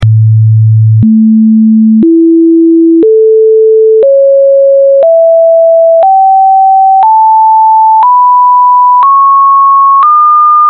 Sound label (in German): Harmonische